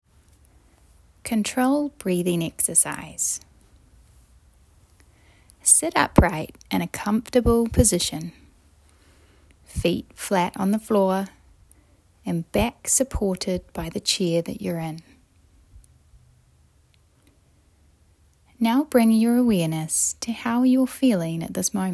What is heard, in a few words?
Controlled breathing; my wellness lab